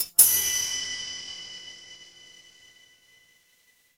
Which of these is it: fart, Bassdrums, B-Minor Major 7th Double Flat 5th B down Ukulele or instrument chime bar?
instrument chime bar